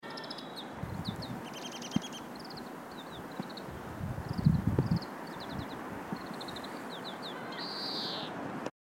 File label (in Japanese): kawarahiwa; tori